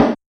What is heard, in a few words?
Kit Snare, Realistic Concertmate-670